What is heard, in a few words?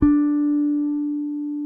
P BASS SUSTN MP D4; Sampleheads; Will Lee Bass Library